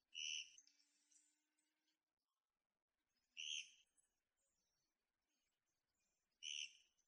kogera nakigoe

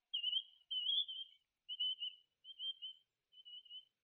kiashishigi nakigoe

